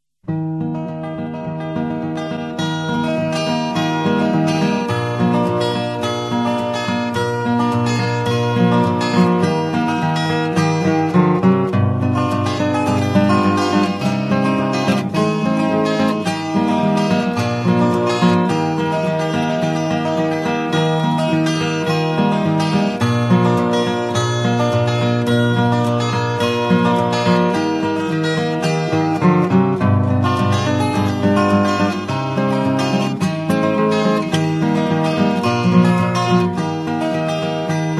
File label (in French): Country Guitare picking Cordes pincees La guitare